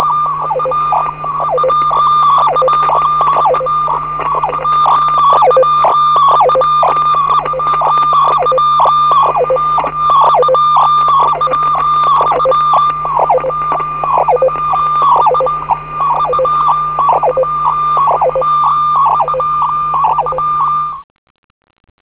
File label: a3c fax